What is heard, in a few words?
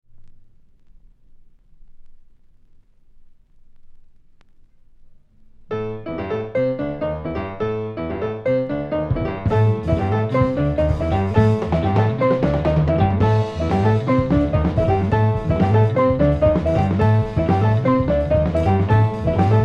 Vocal instru Ens